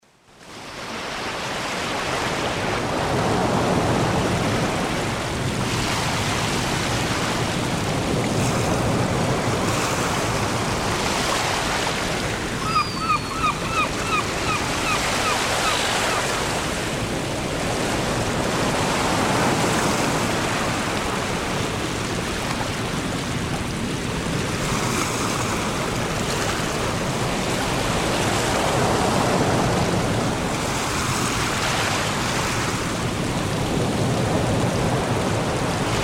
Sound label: Ocean Surf Storm